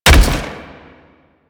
Step; Warframe; Sentients; Erra; Foley

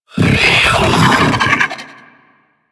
Sentient Death, Enemies; Warframe; Sentients; Ortholyst

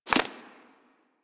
foley idle ear flap; Enemies; Warframe; Catbrow

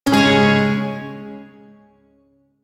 Shawzin Chromatic Chord; Warframe; Duviri Shawzin

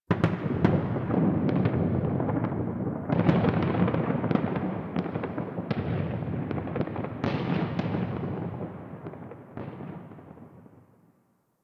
Ambient Distant Explosions; Ambience; Warframe; Nineteen Ninety Nine

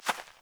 Fawn Footstep, Foley; Animals; Ambient

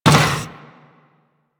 Moa Combined Step Default, footsteps; Enemies; Warframe

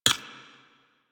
Ricochet; Warframe; Cowgirl

